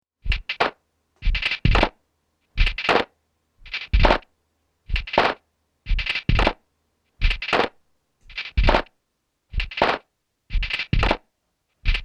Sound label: beat session